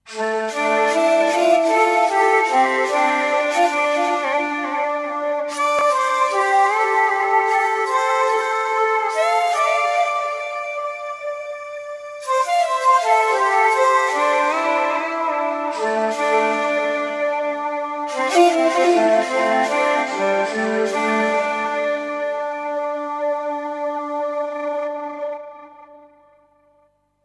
Ethnic Flute, Mod Phaser, Pan Delay, Delay, Reverb